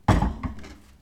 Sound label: Epic Object Placing